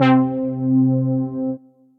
Pluck Brass; Synth Brass; Synergy Pluck Brass; Ableton; Factory Packs; Singularities

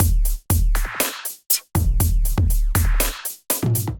Right Side Kit, drums; Hybrid; Ableton; Factory Packs; Drum Essentials

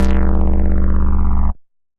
Bass; Solid Steel Bass

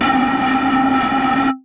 Dynamic Wind; Effects; Ableton; Factory Packs; Synth Essentials; Collision

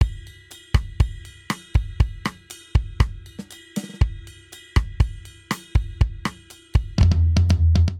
Bossa Nova Verse Variant 120 bpm; 4 4; MIDI Clips; Ableton; Factory Packs; Drum Booth

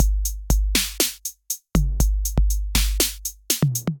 606 Kit, Drum Machines; 606 Excalibur Kit

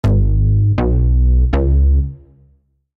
Reso Bass Ab Minor 81 bpm; MIDI Clips; Tonal; Bassline; Grey Panel; Ableton; Factory Packs; Chop and Swing

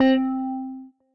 Hoppy Wah Bits Guitar; Guitar & Plucked; Ableton; Factory Packs; Samplification

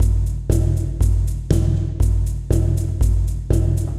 Mammoth Kit; drum racks; Ableton; Factory Packs; Mood Reel

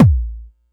digital attacked, Kickbank; mpk mini presets; Ableton Foundation FreePacks

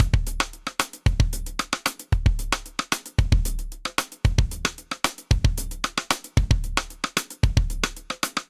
Afrobeat Sidestick 113bpm, Session Drums Studio; Cop Style; Ableton; Factory Packs

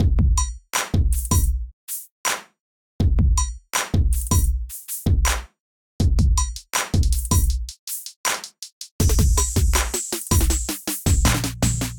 Electrified Kit; Ableton; Bomblastic

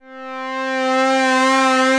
Lone Ranger Pad, Synth Pad; Ableton; Factory Packs; Vibrations; ModeAudio

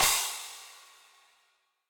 Crash FX 17 Room With A Vista, Cymbal; Drum Hits; Standard Hits; Session Drums Studio

